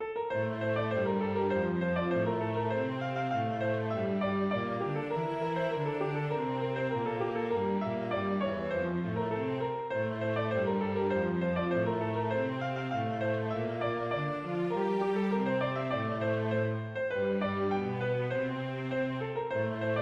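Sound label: MELODY VIOLA CELLO; The Man with Two Wives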